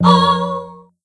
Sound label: scarab appear; egyptesc